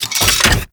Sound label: Rolling Drone Turret Close; Warframe; Grineer